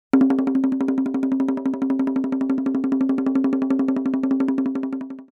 tanggu

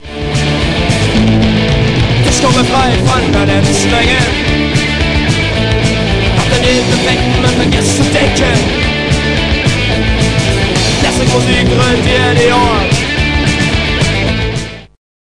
punk

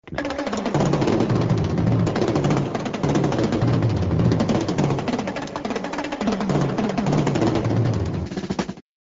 rhythm

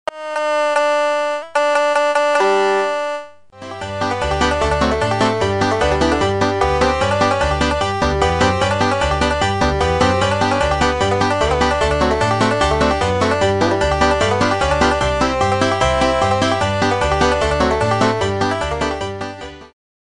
banjo

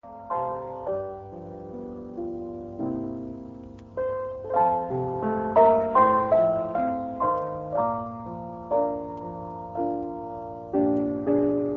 careless love blues

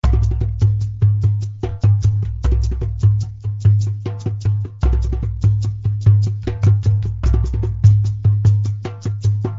drum

